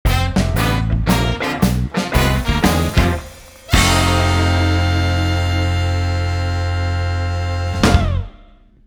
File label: Windy City Short, Jingles; ilife